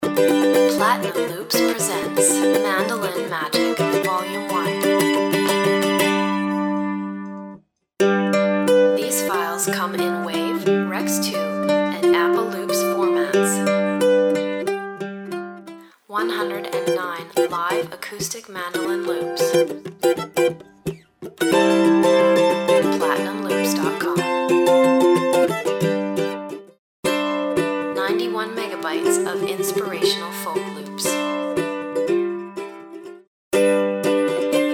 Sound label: mandolin; mandolin magic